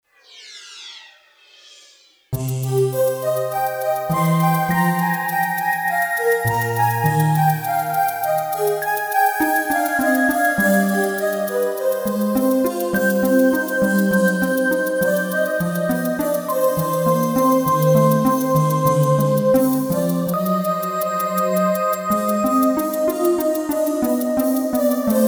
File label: Synthony, Roland JV-880; Factory demo songs